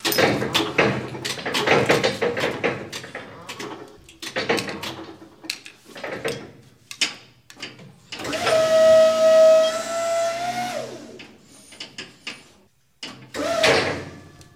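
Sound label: Forklift Electric Movement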